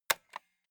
stapler staple loose

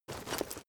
leather metal walk fast single

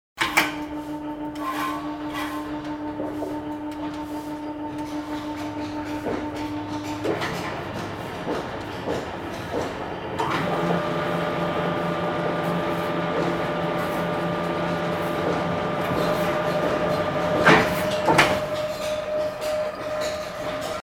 building crane

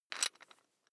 electric drill bit fasten wrench turn very fast

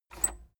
cassette eject release

